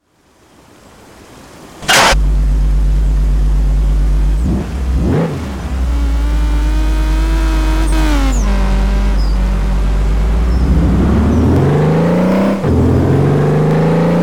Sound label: audi tt; nfs-shift